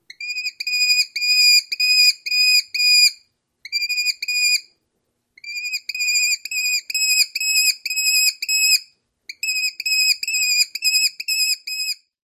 begging calls of captive

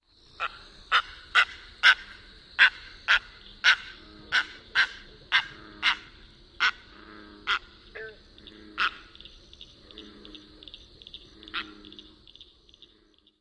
alarm calls of immature taking flight

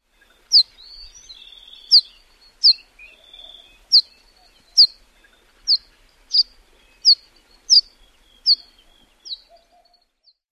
calls

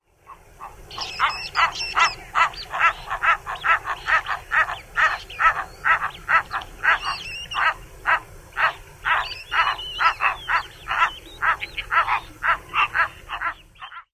adult calls amp squeals of young

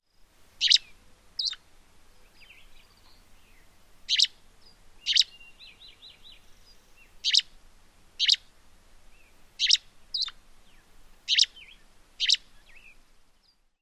song

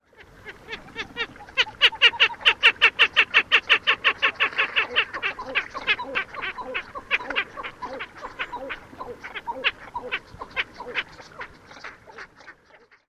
chatter call

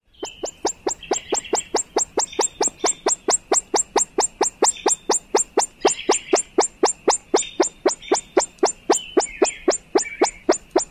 alarm call male

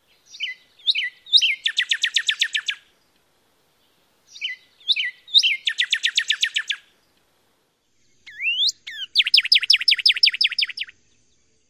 songs; AZ

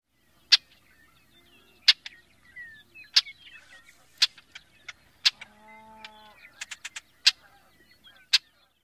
chuck calls

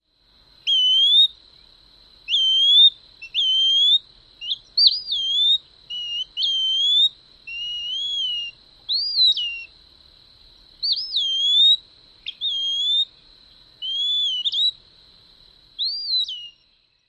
purree calls amp song

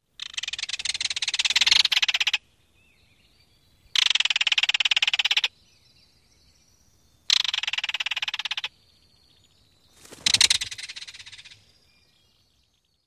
rattles

